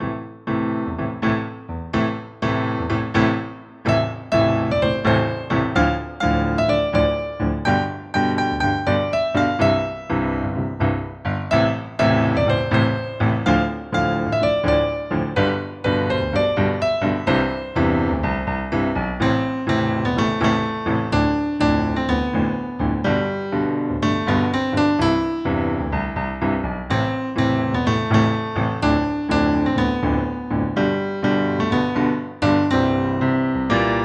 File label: duet melody